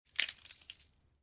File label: Bones break juicy and disgusting